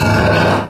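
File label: metal frame scrape heavy; Source Engine; Portal 2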